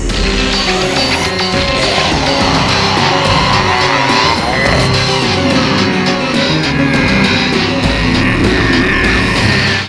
powerup; db mod; cell

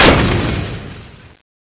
explode, weapons; guillotine

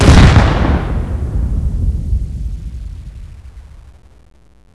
he grenade detonate; csrevo; nades

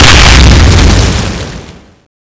explode

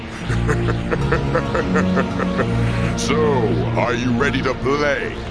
powerup; db mod; superbuu

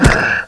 zombie attack; cstrike; zombiebot

